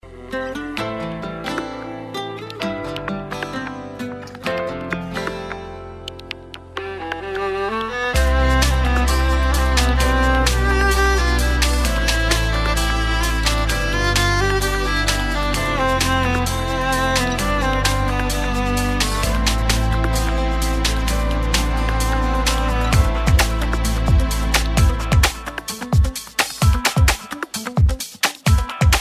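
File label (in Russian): хорошая мелодичная музыка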